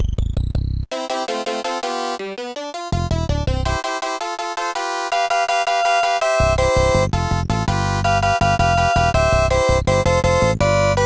labamba; Deluxe Music Construction Set

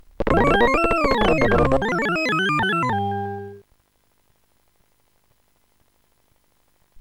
stomp; Sonix Music Driver

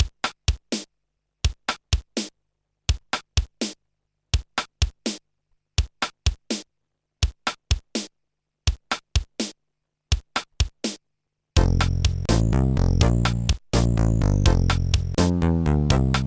country style blues; MED

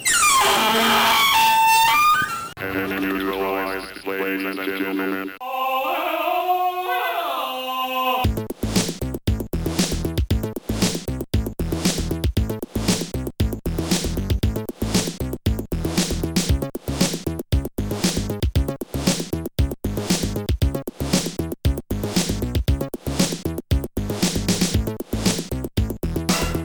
acid housemix; SoundFX 1.3

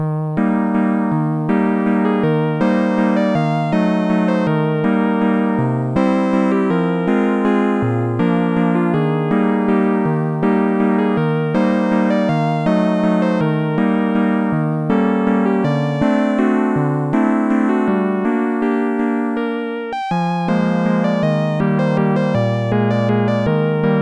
waltz; Sonix Music Driver

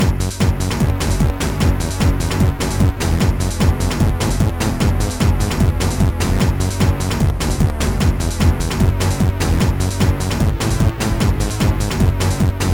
chip is a rhythm; Trackers; Modland

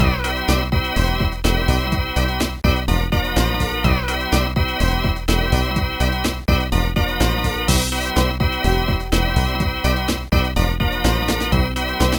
lame organ; Credo; Trackers; Modland

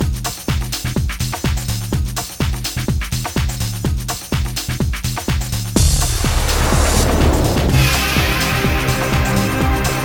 mod techno beat box, Trackers; Modland; techno beat box2

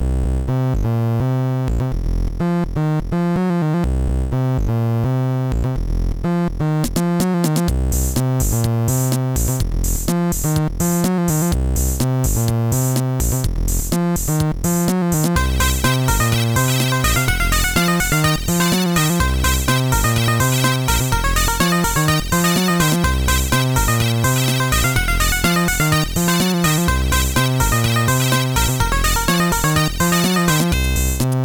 short disco chip; Trackers; Modland

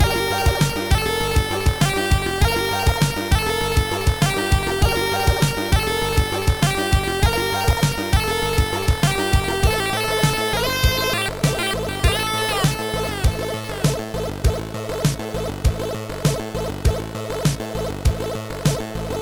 chiptune; Trackers; Modland

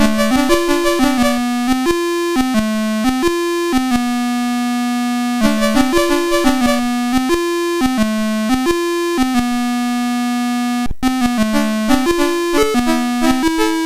sid MOS6581R4; Pacboy

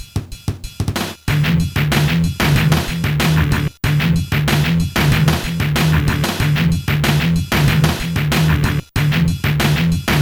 instrumental; Trackers; Modland; Purgatory

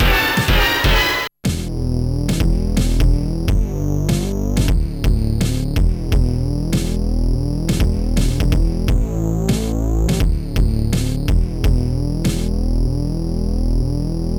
drum solo; OLDIES

